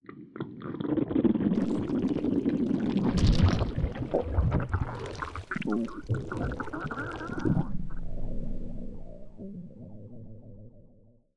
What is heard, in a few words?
death